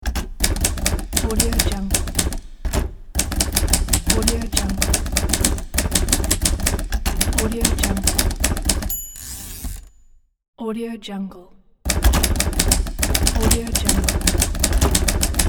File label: typewriter; luca-clerici